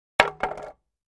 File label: 2x4 Plank Dropped On